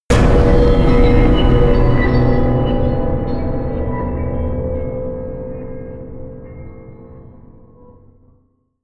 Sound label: distorted bell low